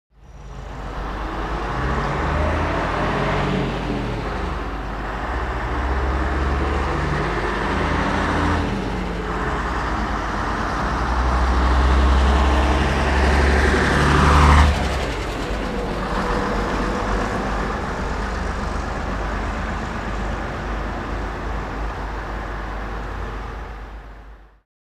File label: Bus By London Bus Up Fr